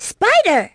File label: Spider